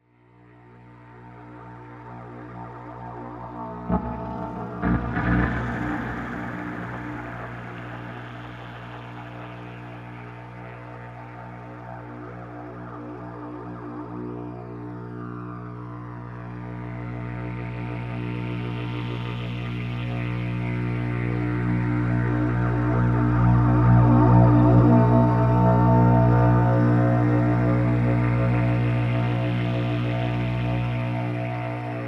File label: electronica